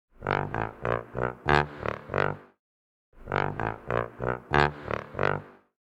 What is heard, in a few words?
Tuba; Luistertuin